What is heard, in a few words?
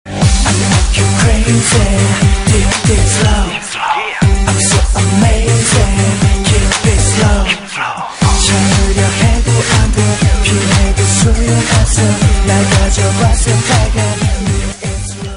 Deep Slow